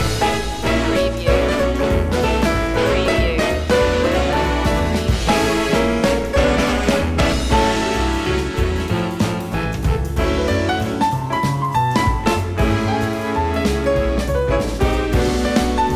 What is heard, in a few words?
big band jam loop